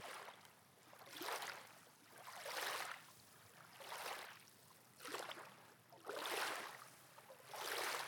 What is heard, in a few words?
Waves Lapping